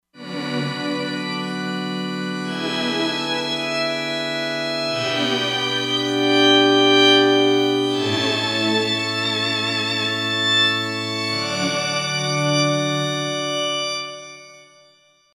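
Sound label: Pad Flang Org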